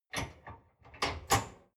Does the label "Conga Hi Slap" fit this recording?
No